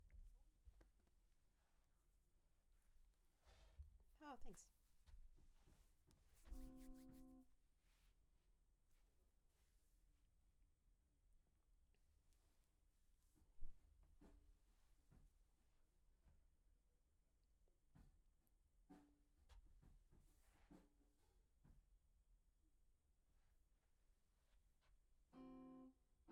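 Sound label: Acoustic Guitar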